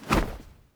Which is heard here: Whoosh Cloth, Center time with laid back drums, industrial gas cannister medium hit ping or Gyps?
Whoosh Cloth